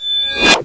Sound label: camera notice, turret; hl2; entity